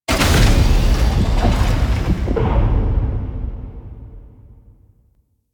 Vault Heart Start; Ambience; Warframe; Infested Eris

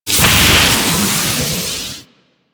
Lightning Rod Strike; Warframe; Ambience; Corpus Gas City; Gameplay Remaster

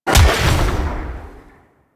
Melee Jump Large Slam Impact; Weapons; Whooshes; Warframe

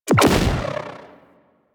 Space Fighter Rocket Fire; Warframe; DE; Vehicles; RailJack; RailJackEnemyShips; Grineer; Space Fighter Dredger Agent

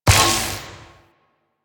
Vial Magnetic Shatter; elements; Warframe; Warframes; Alchemist

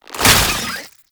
Spore Pistol Reload; Weapons

